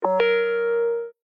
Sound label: input ringtone, nokia sms ringtones